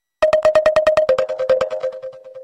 iPhone Text Tone

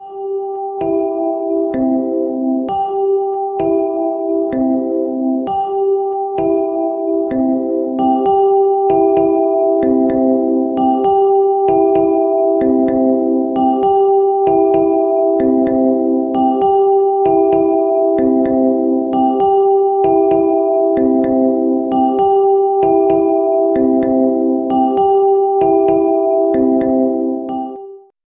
Clock Alert iPhone6

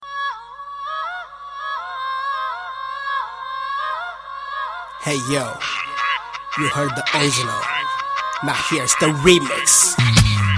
tamil rap

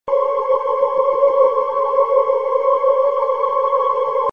Spooky Sounds

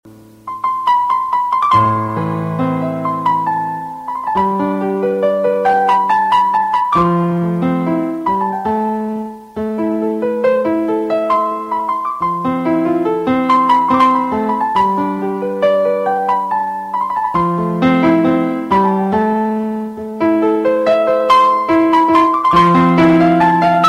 slow n soft piano; classical

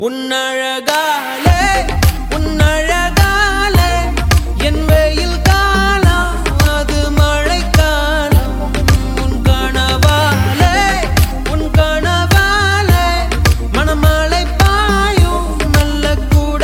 tone, ringtones; ultimate paiya; blues

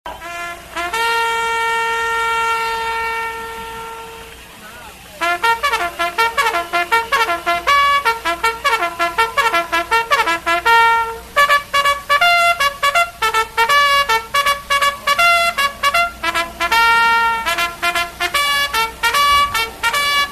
Military Trumpet

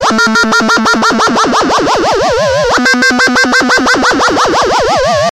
electric space, electronic ringtones

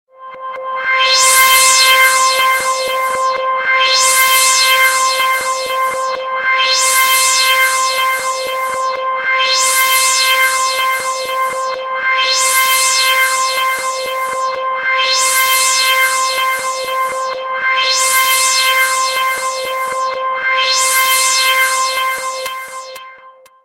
Alien Star Beat

